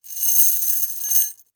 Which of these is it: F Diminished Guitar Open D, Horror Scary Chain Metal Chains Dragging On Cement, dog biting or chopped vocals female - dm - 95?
Horror Scary Chain Metal Chains Dragging On Cement